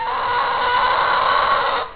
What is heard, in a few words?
chicken; svencoop; virtual